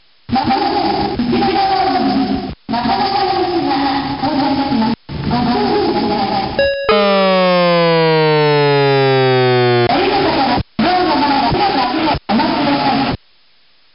metro environmental sounds re-harmonize, metro circuit bent; gameboy noise; weeklybeats-2018